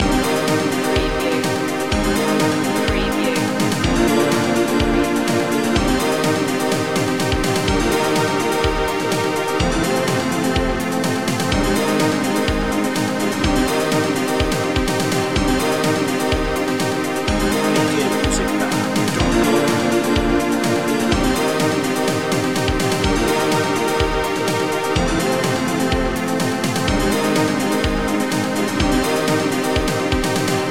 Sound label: retro video game loop